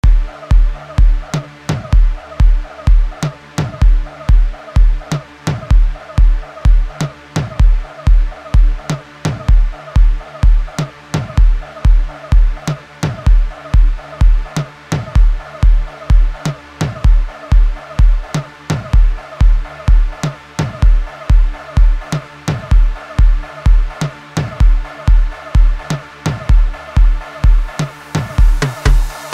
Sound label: Thunderstorm